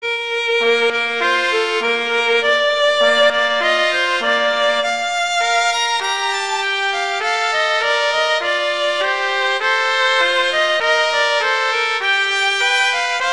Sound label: Duet; Mozart; Duets